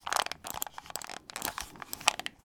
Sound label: technology computer mouse movement in hand